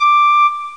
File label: WOOD